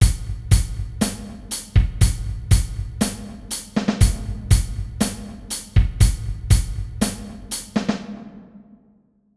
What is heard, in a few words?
60 bpm, batteur